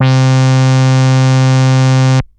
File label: Techno leads, hits and riffs